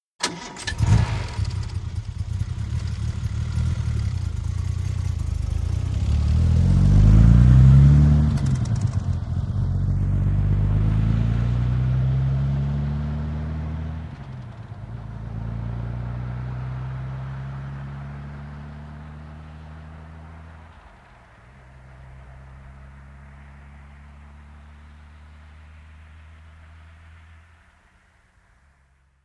VEHICLES ASTON MARTIN: EXT: Start, depart, slow.

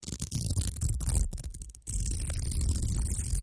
velcro tear

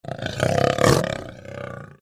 Puma Cougar Growl Sin, big cats cougars

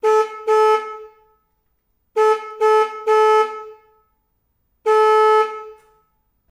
Forklift Horn Honks

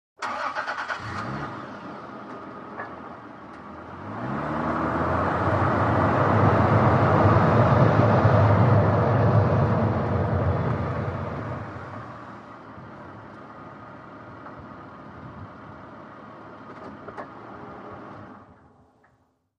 SUV Engine Accele

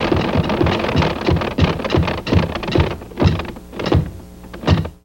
Car Tire Flop In, Stop

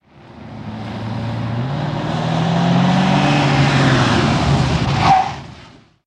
Cadillac In Fast And Stop, Skid, Asphalt

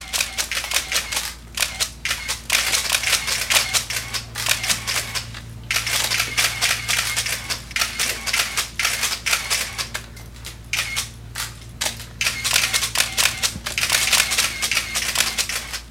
Cameras Taking Shot; shutters & movement

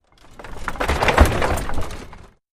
Carriage Jolts

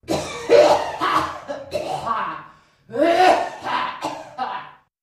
Man retching into metal tank; coughing & spitting

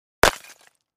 Clay Chimney Vent Hit, concrete & brick

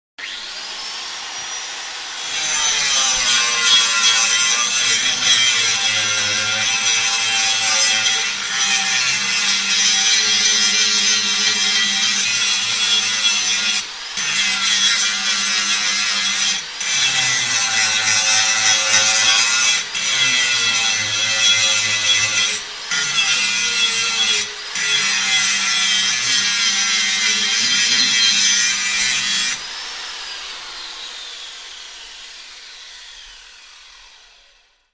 Grinder Industrial

